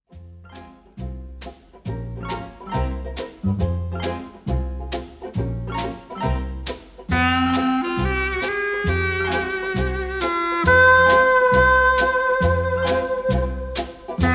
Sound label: Lonely Clarinet